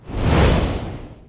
Fireball; Opsive; UltimateCharacterController; Magic

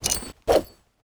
Frag Grenade Pin Pull Throw; Opsive; Ultimate Character Controller; Items

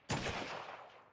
Medium Weapon Shot

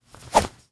Punch Kick; Melee; Body

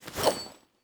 Frag Grenade Throw

